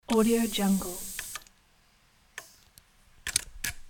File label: robothead mechanism